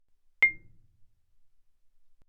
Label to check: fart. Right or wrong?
Wrong